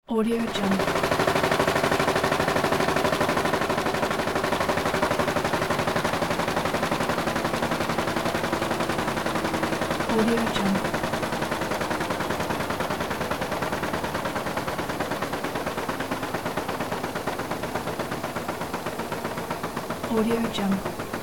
newspaper press running; sound-ideas

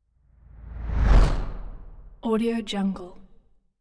whoosh

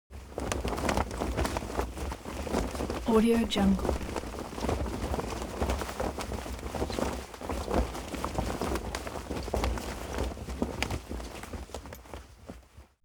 object flag flap stormy; smartsoundfx

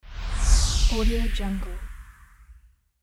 energy woosh

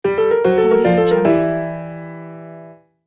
piano question transition

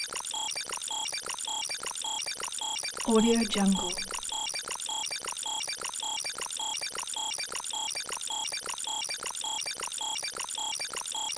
alarm loops

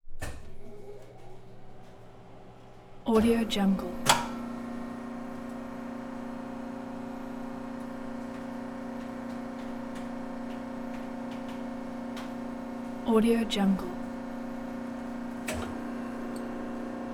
horizontal mill; prosoundfx

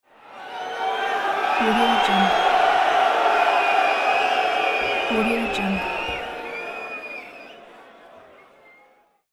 crowd of booing sport fans

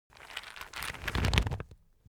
object nut crunch; smartsoundfx

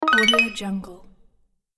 level passed

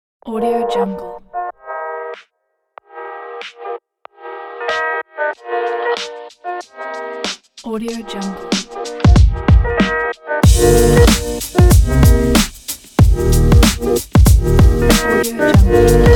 abstract chill hip hop

